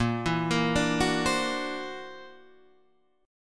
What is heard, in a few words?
C 9th Flat 5th Bb